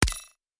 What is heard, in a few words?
Button Click